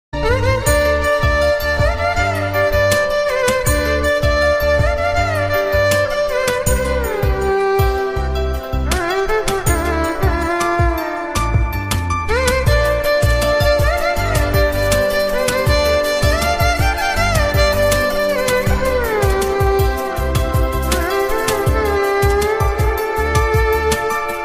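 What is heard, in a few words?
violin ringtone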